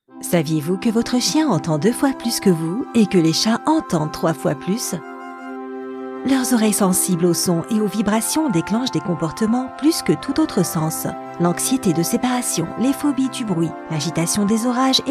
Canine Calm; Pet Acoustics

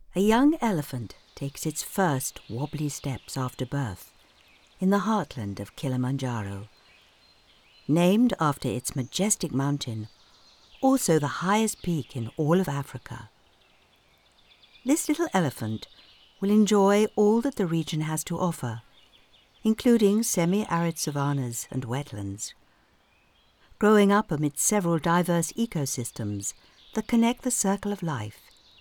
elephant

